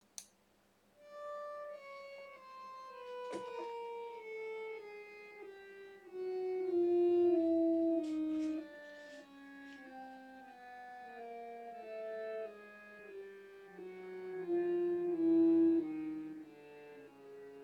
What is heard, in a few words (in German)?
Reiseharmonium